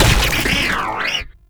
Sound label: Holographic Decoy Ring Ability End; Infested Lich; DJ Rom